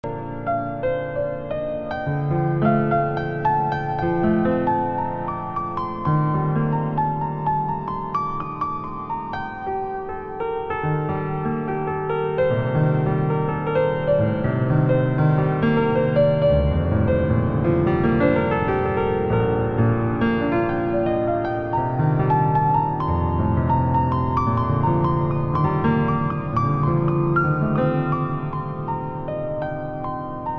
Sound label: piano